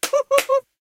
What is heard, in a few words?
clap; irish pot luck